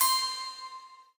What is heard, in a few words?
countdown